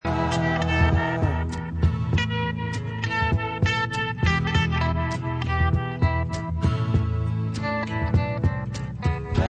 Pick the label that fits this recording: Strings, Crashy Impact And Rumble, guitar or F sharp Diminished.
guitar